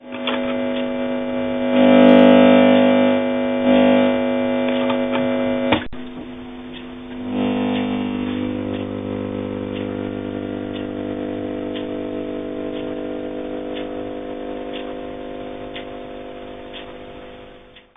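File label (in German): Röhre Brummen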